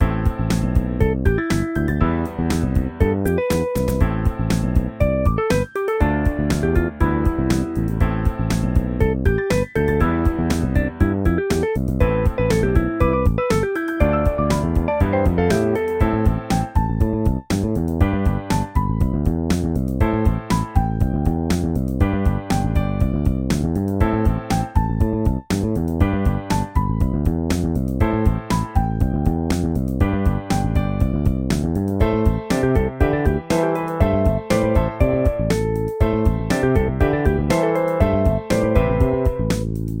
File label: harmonic